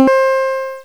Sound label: new highscore